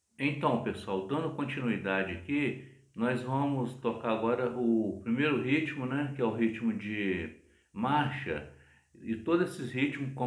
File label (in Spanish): Ritmo de marcha